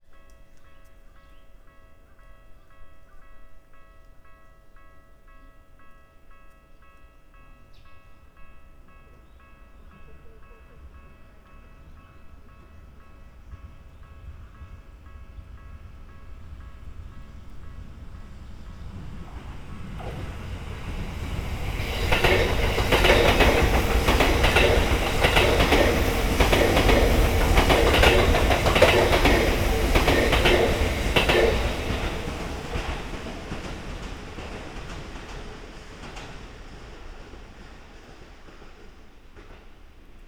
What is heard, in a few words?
train; RM-ATZ19